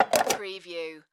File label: Cup Stack Plastic